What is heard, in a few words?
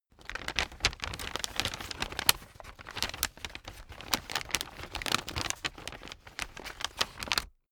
paper wrapping handle